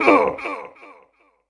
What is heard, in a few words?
Death; Shady Assassin; Kilta